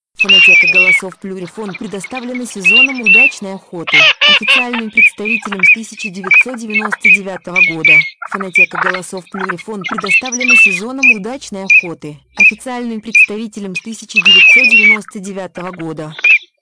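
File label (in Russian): свистунок, манок; plurifon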